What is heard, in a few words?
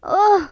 die merchant female